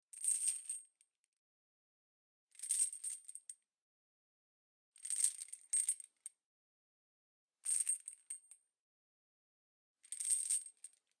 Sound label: Chain Metal Rattle; Kvv Audio